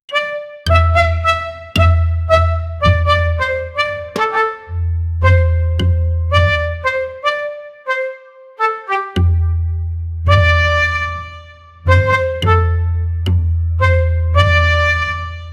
create mystical instrumen, ceremonial

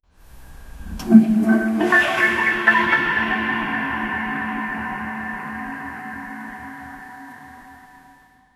really long drawn out far, bathroom

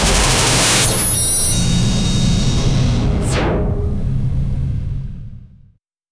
heroic sting

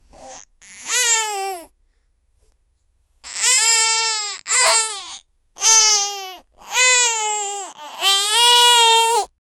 baby crying sound

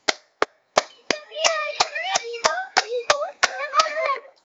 footsteps tap tap high

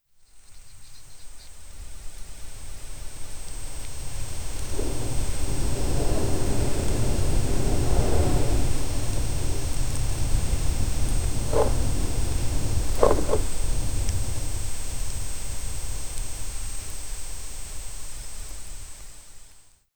the lantern releases a st, creatures

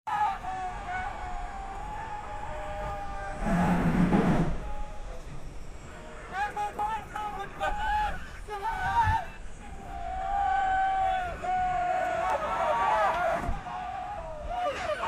fierce gunfight on the st

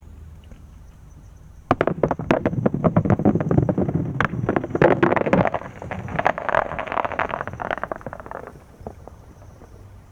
a large rock falling onto; destruction

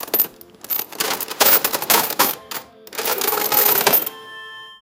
cash machine sound; finance

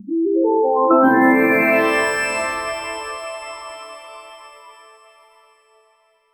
gentle modern intro, corporate

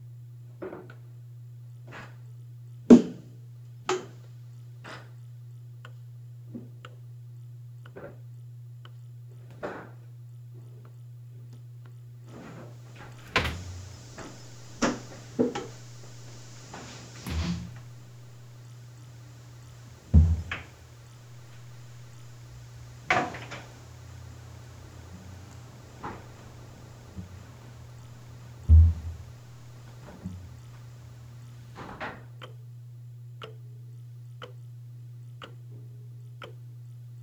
a sharp mechanical alarm

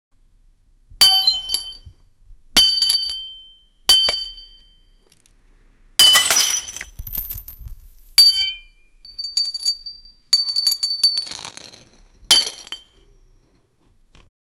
glass breaking and shatte; impacts

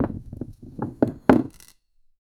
like sfx of cra, crafting; minecraft

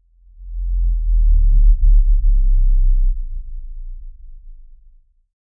deep low growl with slith, creatures

